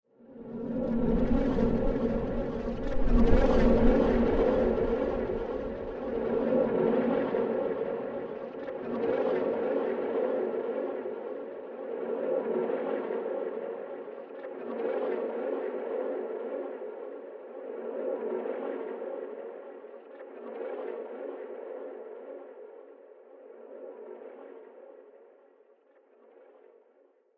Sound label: Horror In The Sewer Ambience; Scary Soundscape Sound Effects